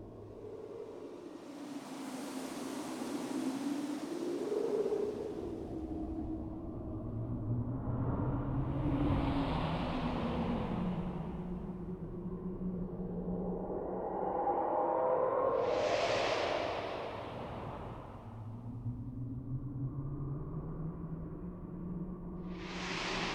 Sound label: Complex Wind; planeshift